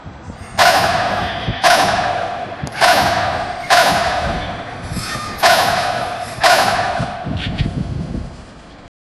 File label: raging building fire